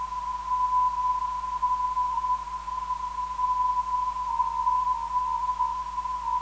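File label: 362kHz, non-directional beacon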